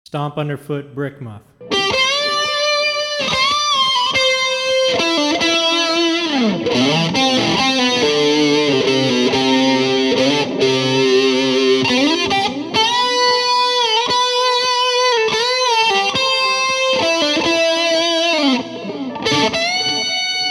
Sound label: BRICK MUFF VS VRH Tube Driver Flanger Nova Delay Solo; HartmanFlanger; TCNovaDelay